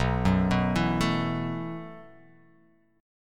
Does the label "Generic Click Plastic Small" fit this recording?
No